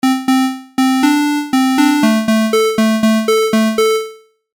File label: Synthesizer parameters Init Patch; The first example is the init patch of my synthesizer.